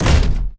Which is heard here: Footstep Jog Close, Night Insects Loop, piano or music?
Footstep Jog Close